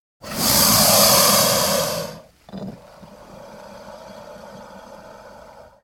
Alligator Growl, Breath. Alligator Inhales, Then Growls Slightly On Exhale. Close Perspective.